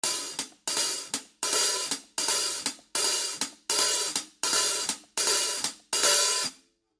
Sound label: 14 HH Open Closed Pattern; ZILDJIAN